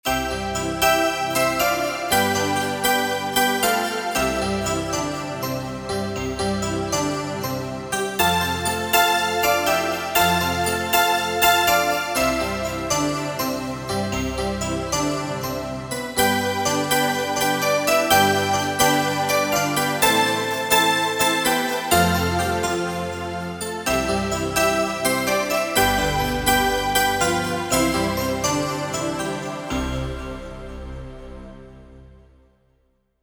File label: pad